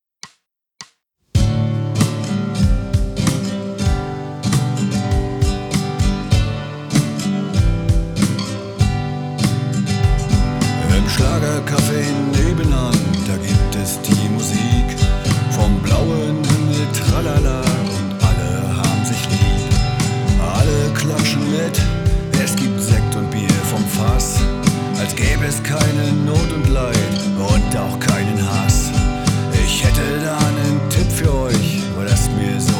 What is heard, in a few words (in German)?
Rock Musik